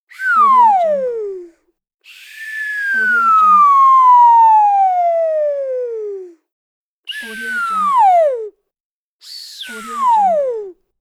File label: whistle long slow fall down